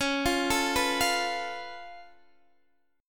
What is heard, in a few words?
C sharp Augmented 7th C sharp Guitar DADGAD; down; chord shape